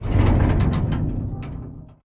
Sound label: damage hits explosions, Warships